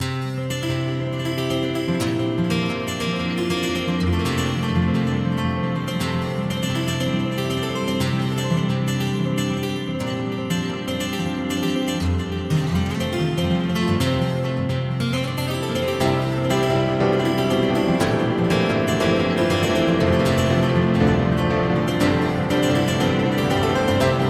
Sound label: Delay + reverb